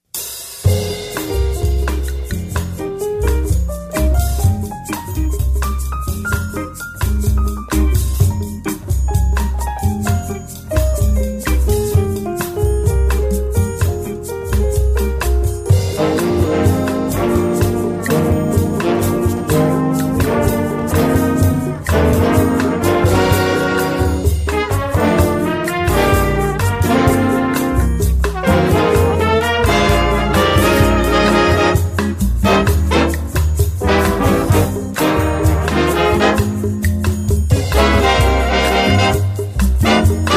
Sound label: bossa